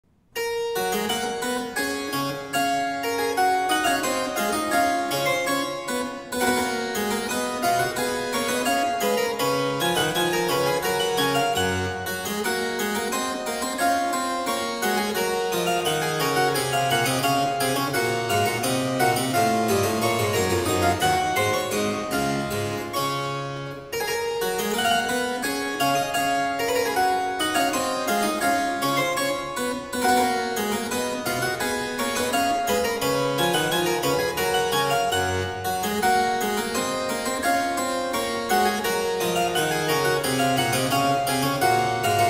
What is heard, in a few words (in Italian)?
variatio 18 canone alla sexta a 1 clav.; the goldberg variations bwv 988